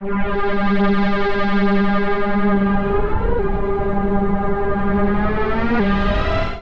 Rage FX G Minor 145 bpm; MIDI Clips; Ableton; Factory Packs; Skitter and Step

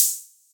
Operator Hihat; Instruments; Percussive; Ableton; Synth Essentials

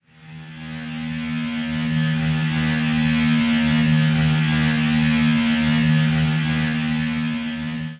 Razor Wire Drone; Instruments; Laboratory

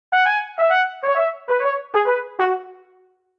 Phrase Toppling E Major 132 bpm, Brass Quartet; MIDI Clips; Ableton; Spitfire Audio

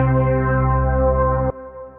Tape Organ; Synth Keys; Ableton; Factory Packs; Singularities

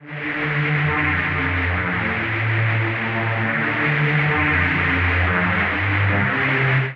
D Minor 138 bpm, pads; MIDI Clips; Tonal; Heavy Weather; Skitter and Step; Ableton Factory Packs

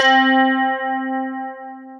Square string, Synth Keys; Instruments; Ableton; Synth Essentials; Tension

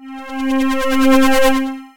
Wasp Riser, Synth Pad; Instruments; Ableton; Synth Essentials; Operator

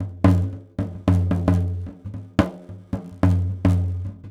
111 African Bembe; World; African Percussion

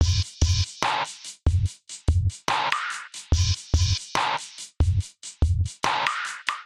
Bouncy (Cmin) 144bpm Straight Beat Variation 8th; Ableton; Factory Packs; Samplification

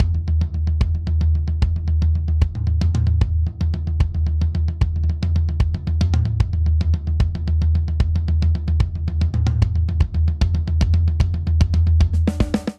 Triplet Hip Hop Buildup 75 bpm; 4 4; MIDI Clips; Ableton; Factory Packs; Drum Booth

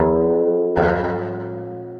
50s Slide Rule, sci-fi effects; C3-D#4; Ableton; Mood Reel

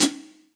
Hihat Foot Reso Classic; Drum Hits; Standard Hits; Ableton; Factory Packs; Session Drums Club

